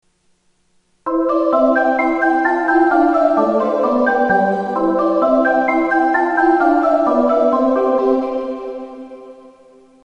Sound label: opening echo